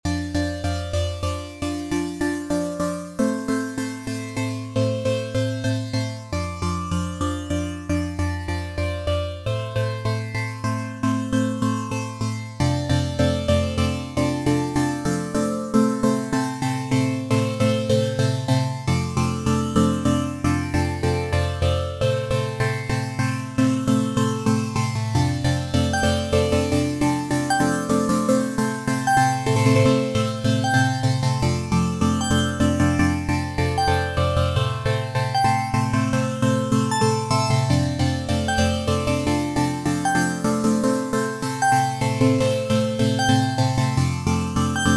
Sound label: synth ver